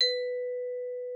VIBES, H4; Xsample VOL-4

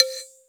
xylo C4; XYLOPHASE; Synth

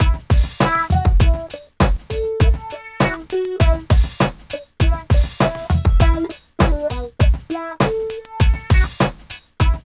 LOOP -L; East West - Phat & Phunky CD1; 90's Sample CDs

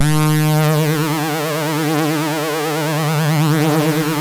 Synth and Organ

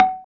KULINTANG G5; Spectrasonics; Heart of Asia; KULINGTANG

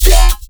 Bass

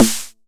909 SD; Goldbaby Tape808 & Tape909; The Tape 909

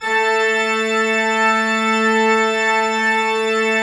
Cathedral Organ; Propeller Island

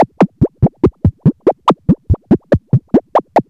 MACHINE BEAT; Zero-G Ambient 1

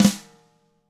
TAMA SNRD; ILIO; Double Platinum Drums 1

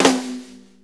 6-8 Snare Flam; 6-8 Loops; Flams; Drum Tools

